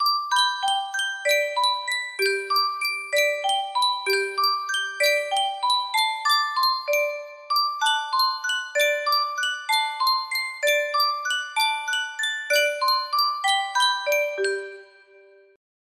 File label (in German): sankyo spieluhr